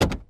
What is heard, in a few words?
vehicle sound door